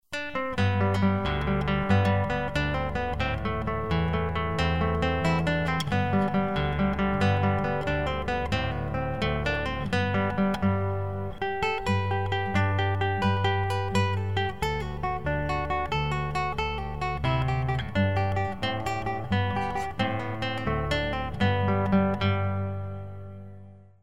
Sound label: Guitar; Irish Washerwoman